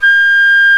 WOODWINDS

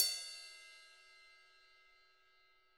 Drums, TAMA; ILIO; Double Platinum Drums 1; TAMA+TAMA

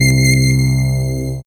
C, Drum & Bass Pads; Total Drum&Bass; 90 s Sample CDs

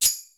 DANCE SET, DRUMS

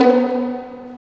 STR VLN PI, String Vlns Pizz; Roland - String Master Series; Vls Pizz

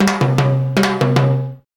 TIMBALES; Zero G - Ethnic

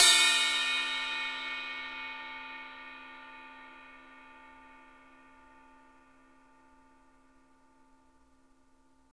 RIDE, Roland MV8800 RIDE CRASH

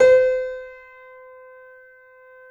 C7 F, The Ultimate Piano Collection; East West

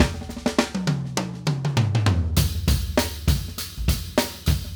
Acoustic Live Drum; Platinum Audiolab

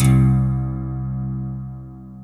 C2, SynthLine

